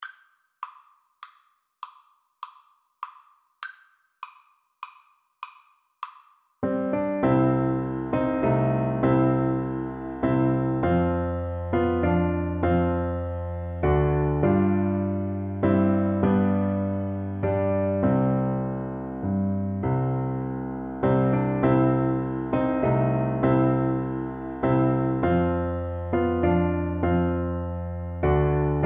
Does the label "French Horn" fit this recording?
Yes